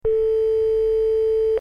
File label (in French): Sonnerie